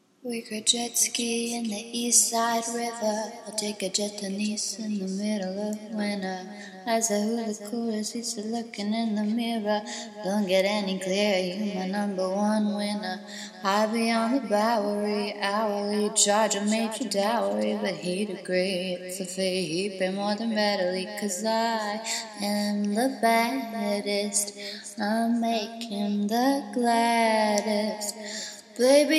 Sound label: Acapella